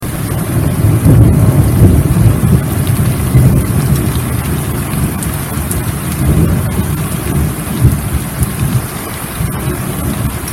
jingle orage; lusseray

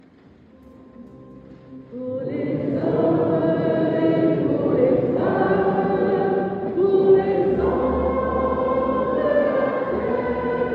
MESSE FETE PAROISSE CHANT PRIERE UNIVERSELLE; CHEF BOUTONNE 79; EGLISE NOTRE DAME

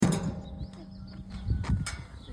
portillon entree; BRUITS DE PORTAILS; BERNAC 16

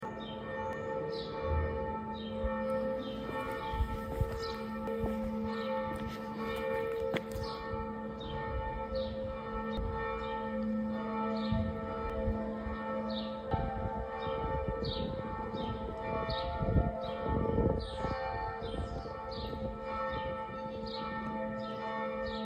EGLISE NOTRE DAME SONNERIE; CHEF BOUTONNE 79

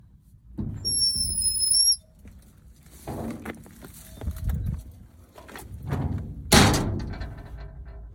ENTREE PORTAIL; LOUBIGNE (79); BRUITS DE PORTAILS

